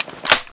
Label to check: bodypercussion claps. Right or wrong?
Wrong